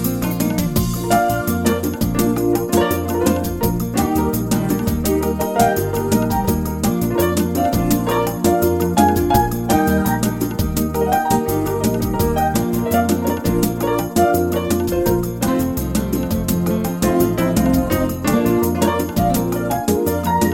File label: Modern Bossa Nova